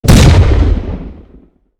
Bomb Thrower Big Explosion; LooterKings

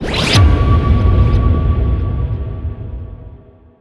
Ui Levelup; super sanctum td

